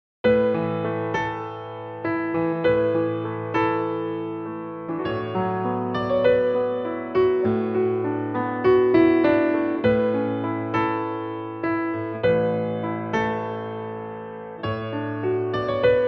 piano A; range - A to D; Piano only arrangement

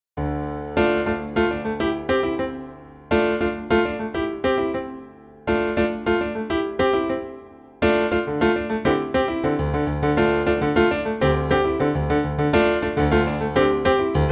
Dm piano; key - Dm - vocal range - G to A (optional D big note - "measure"); Punchy and bright piano arrangement

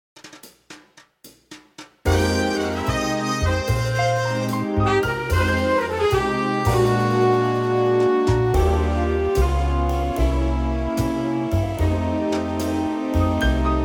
big band Eb

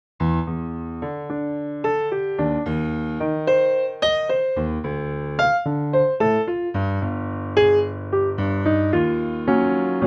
Bb piano

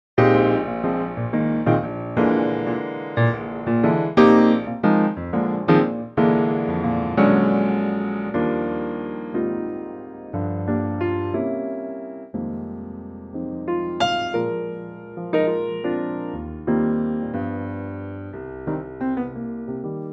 Eb piano; Pianotrak; vocal range - Bb to D

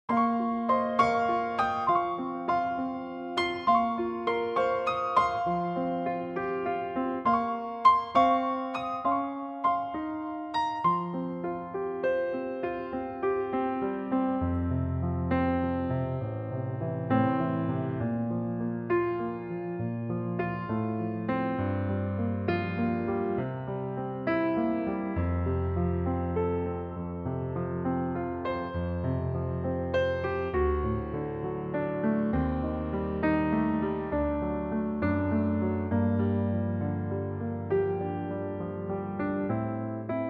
piano F

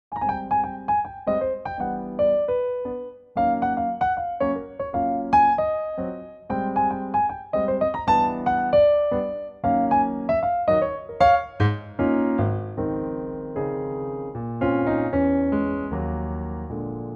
Db piano; 2018 Pianotrak; key - Db - vocal range - Db to Db; Wonderful piano only arrangement, stays in tempo all the way

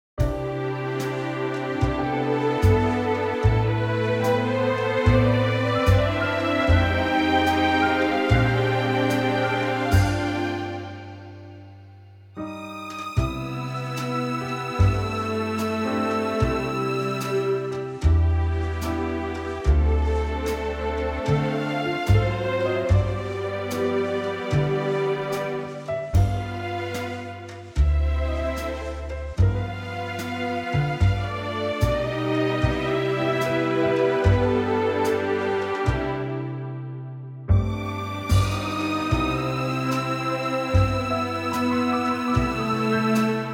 C orchestral; key - C - vocal range - C to E

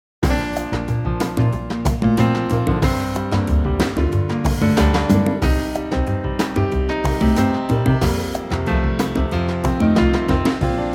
Bb Trio

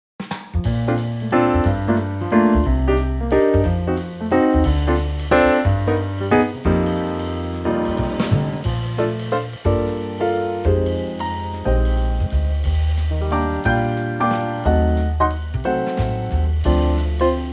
Bb 3/4 Trio; key - Bb - vocal range - F to G

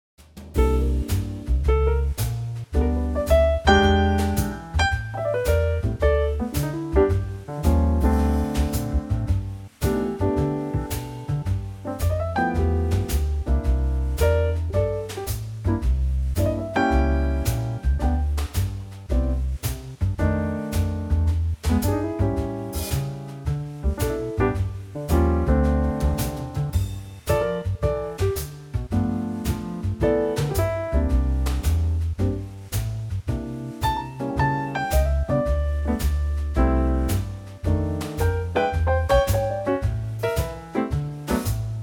G trio

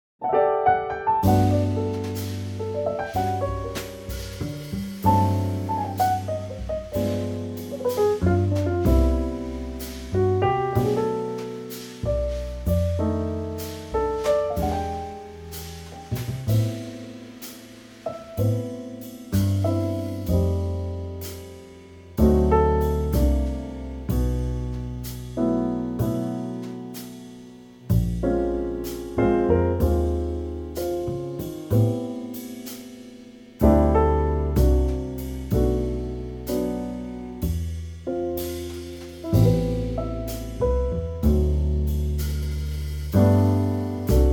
G trio

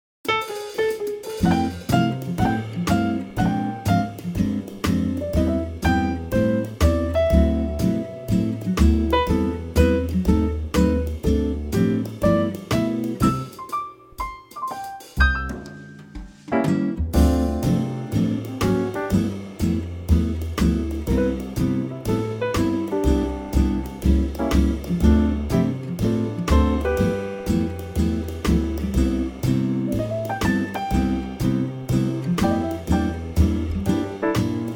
Quartet; key - C

